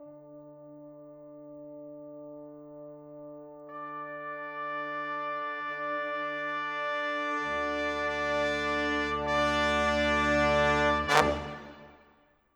Brass, Orchestral, Rock Pop

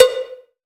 COWBELL GATE

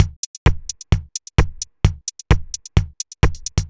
Just Add Kick, 130 BPM, Percussion; Sonic Foundry (Sony Creative Software); Underground UK House & Electro

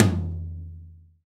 TOM; Best Service Gigapack 2

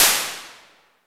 SLAP, Simmons SD-8 Drums

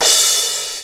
Crash, EMU PX-7 Drum Machines

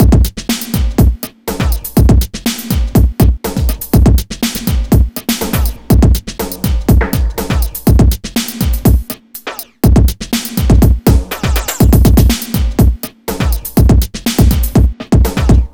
Beat, Drums, Eclectic Electronica

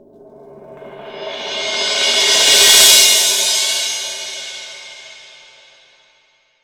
18 old mallet, crash cymbal

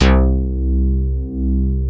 OBDX FM, SYNTH BASS; East Collexion; Bass S3000

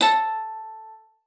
A4, VIOLA FINGER; Xsample VOL-5

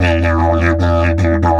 150, DIDGE RIFFS

